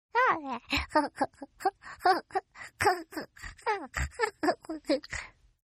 Baby Laugh; Laughter; Voice; Partners In Rhyme

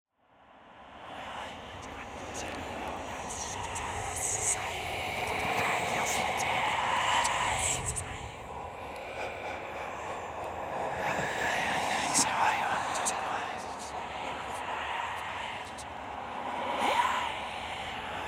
Drone Whispers Reverse; Production Element Imaging Element Texture Bed Atmosphere Synth; 5.1 STEREO MIX; Blastwave

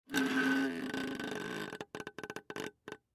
Straw Move Squeak, Straw Move Squeak Cup Lid; Foley; Drink Fountain Soda Pop Fast Food; Miscellaneous Bar; Blastwave

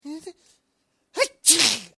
Sneezing Male Sneeze; human sound effects

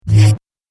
Laser Energy Bolt, laser gun; Laser Gun Sound Effects

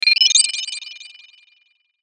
Mouseover Stutter Delay; Multimedia; Blastwave

